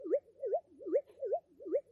shyness emote; animal crossing

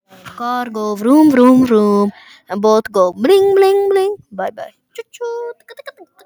car goo vroom

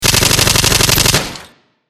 autofire smg

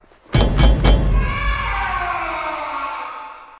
scream lamento

